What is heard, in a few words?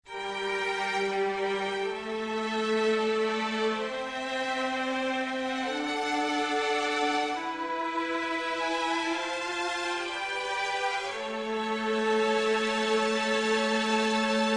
m400 violins